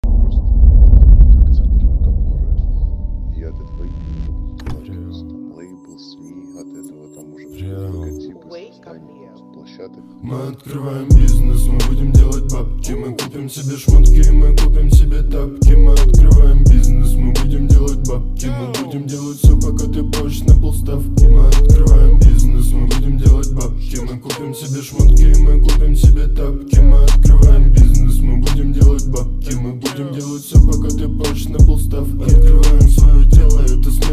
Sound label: slow bass remix